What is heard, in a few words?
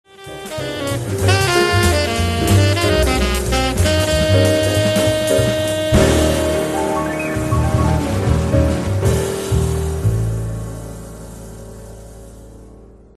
jazz